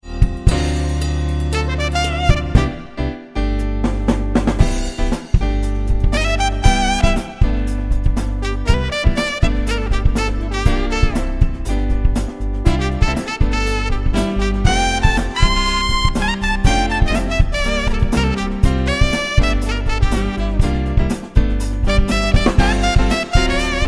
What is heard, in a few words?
Peg Solo